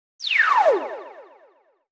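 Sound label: Ghost Charge; Pac-Man Championship Edition 2 Plus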